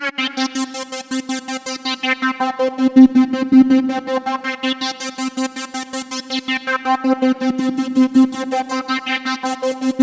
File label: Roland JV 2080 LFO C4; MISSION LFO